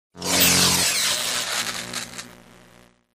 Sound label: Electric Arc Short Circuit Electric Shock; arcs & sparks